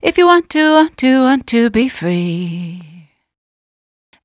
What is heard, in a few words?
If you want to be free female vocal